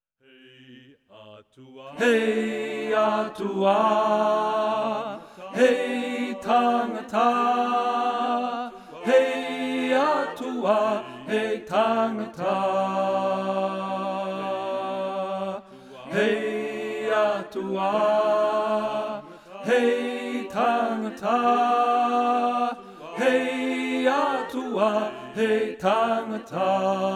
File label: tenor; he atua he tangata; sing for water 2020